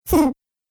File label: Cartoon Gremlin Laugh; Cartoon Voice Sound Effects